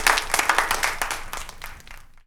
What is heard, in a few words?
APPL, XP APPLAUSE